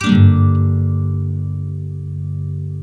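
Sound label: NYLON, G MINOR UP, CHORDS; Spectrasonics; Hans Zimmer Guitars VOL-1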